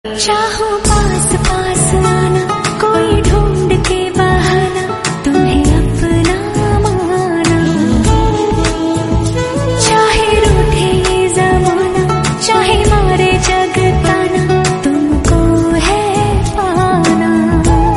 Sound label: heart touching ringtone